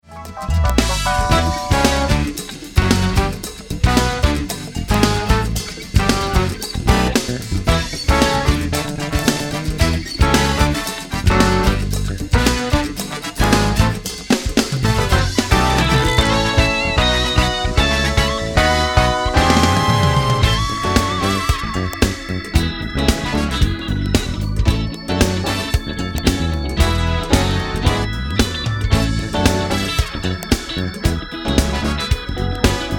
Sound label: Funk R&B Styles